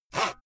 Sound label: saw use short